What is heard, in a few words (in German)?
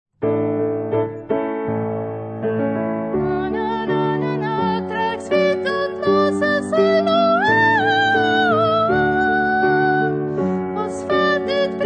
Sopran Oberstimme Refrain